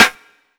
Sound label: clicky snare drum sound g key, snare drum sample tuned to the G note; snare drum samples